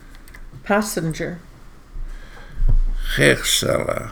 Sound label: Passenger